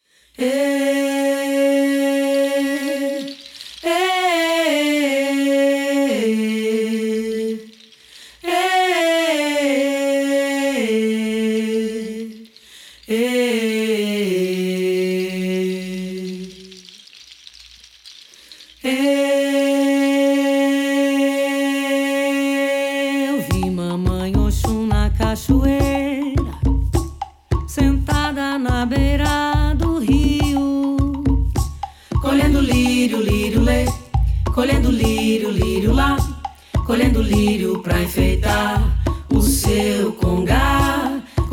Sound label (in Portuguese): canto pra oxum